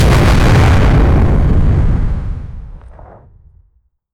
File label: nuclear explosion; catastrophic